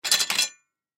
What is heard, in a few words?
Shopping Cart, Shopping Cart Set Down; Stores and Malls; Foley; Blastwave